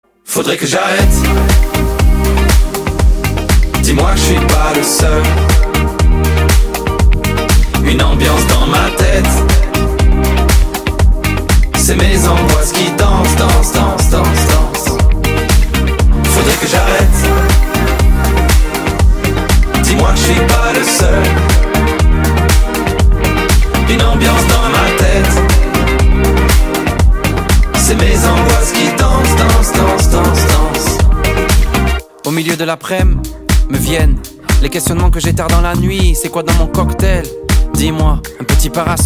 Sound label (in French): Coup de Blues